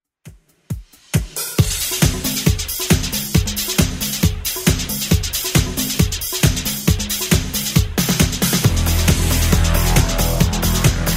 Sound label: 80s Redrum 136bpm